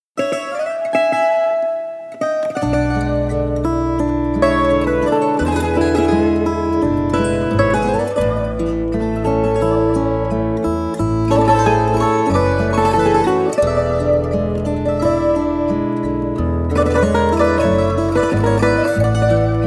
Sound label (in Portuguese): Guitarra